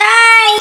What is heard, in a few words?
normal hit whistle; osu